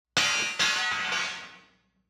int metal iron steel drop concrete; ambisonic; sonniss gdc

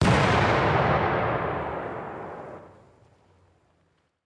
Mortar Impact; lancer weapon fx

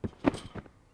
trampoline realistic

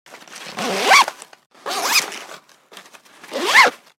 zipper case; sonniss gdc

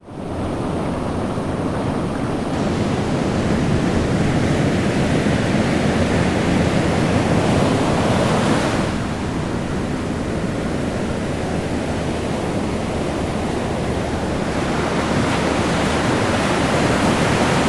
ocean waves surf close; sonniss gdc

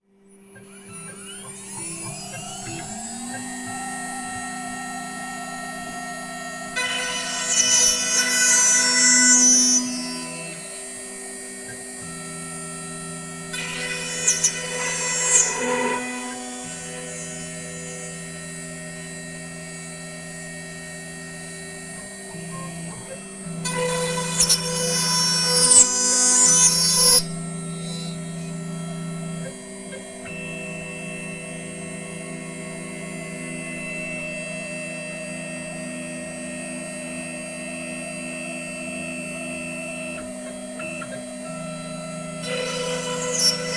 cnc milling machine working stereo; sonniss gdc

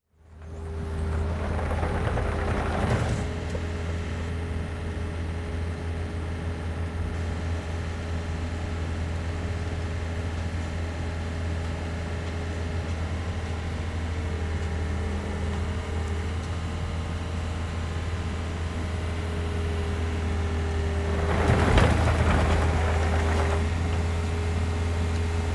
just ambience construction site; sonniss gdc

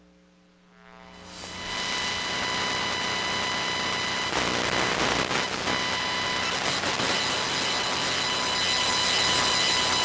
lost transmissions noise loops; sonniss gdc

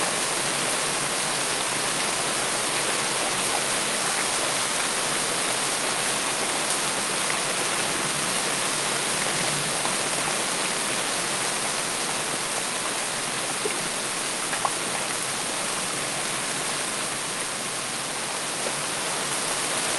ambience rain moderate loop; Sonniss GDC

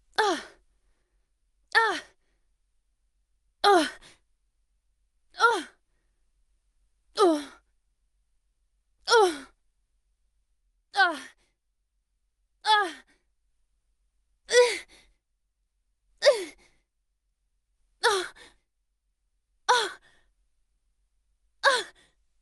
female grunt getting hit medium intensity

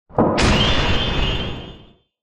impact magical effects generic

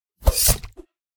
sword, weapon attacks; psfx

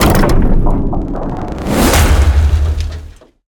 whoosh hit; bluezone; sonniss gdc

